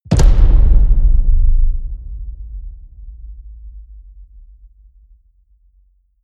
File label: drum hit hollow large; music elements; percussion